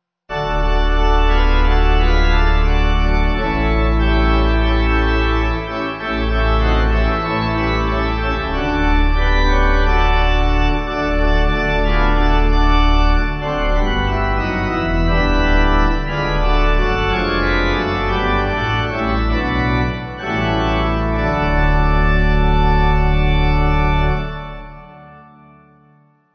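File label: Pipe, Pipe Organ backing; A Little Talk With Jesus; 2/D Sing twice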